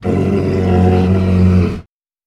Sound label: creature vocal